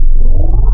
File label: Fem Ooh Vox, wavetables; Animoog